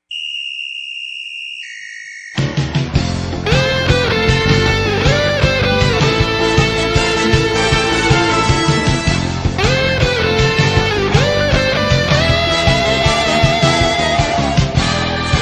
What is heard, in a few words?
guitar version; captain tsubasa